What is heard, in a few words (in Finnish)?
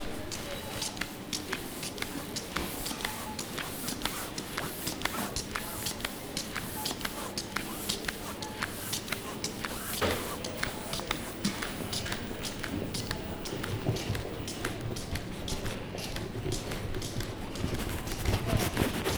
kavely sisalla liukuesteet